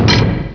lock

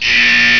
BUZZER

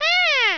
AAARGH

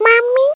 ALARM

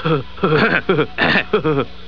HUHU HEHE

